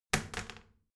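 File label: toilet seat lid wall open regular, bathroom; haus-garten; badezimmer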